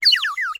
Bird whistle, clay, painted, water, airy, wispy, burst, strong, short; Eiravaein Works; Vaeyan IV